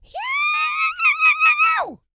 YELL, MALE HUMAN; Spectrasonics Vocal Planet

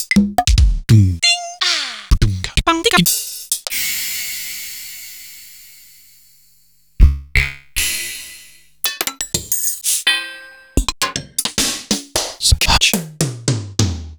Korg Wavestation

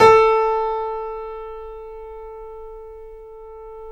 KEY, Steinway ff

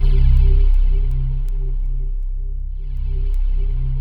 C2, Synth Leads

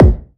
BD, BASS DRUMS; EdgeSounds

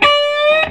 Vintage Blues Guitar, SOLO

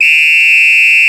Repito; Planet Earth; Digital Sound Factory Planet Earth

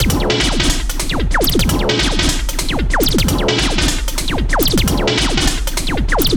LOOP, 150; INDUKT; Masterbits - Tekkno Trax; D LOOPS

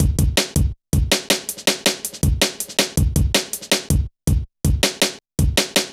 Kicker, Jungle, Drum Loops 161.5; Zero-G Jungle Warfare 1, 2 & 3

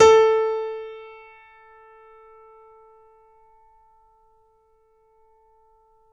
Grand Piano A3; AKAI S6000 CD-ROM - Volume 2; Grand Piano 256M

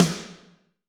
Snr Spiked, Alesis D4

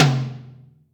Room, Acoustic Tom

